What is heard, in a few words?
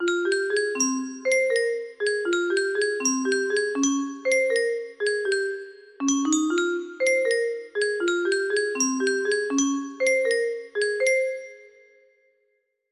melody, music box